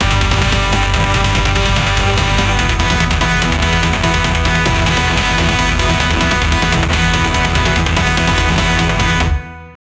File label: epic aggressive loop